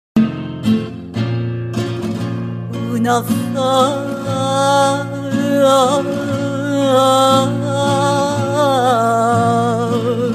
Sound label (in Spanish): farruca